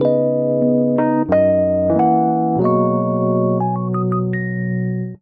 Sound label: Progression Melody & Chords ii - V - I B Major 92 bpm, Electric Keyboards; Ableton; Factory Packs